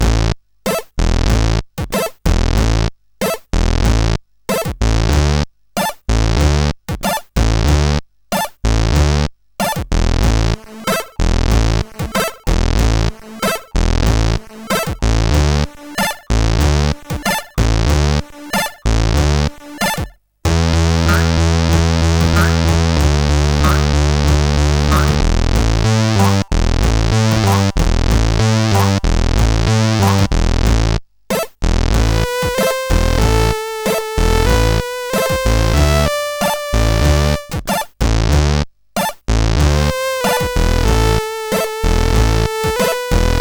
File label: Intro Tune, CSG8580R5